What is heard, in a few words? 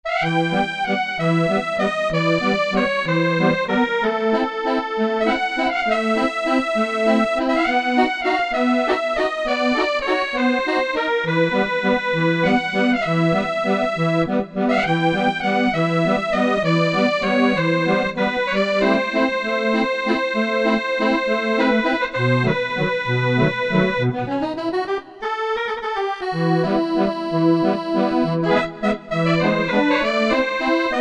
Accordian, Korg MSC-11S Brass